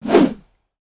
Fast whoosh transition

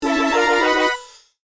Power up retro achievement mobile game; level up

